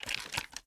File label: reload fast end; gmod